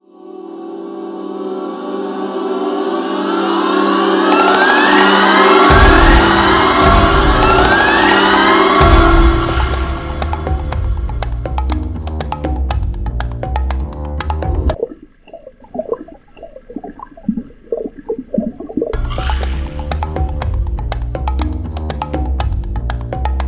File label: Soundscape Audio